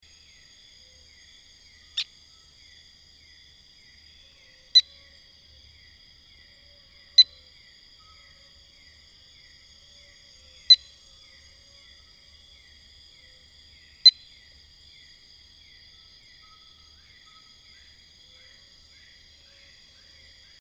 Sticky frog Kalophrynus heterochirus call south Sarawak